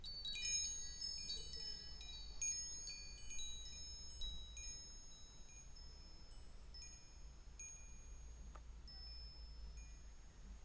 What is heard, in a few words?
wind chimes